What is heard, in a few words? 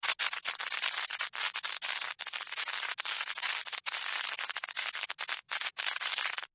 Geiger Counter; nordlead2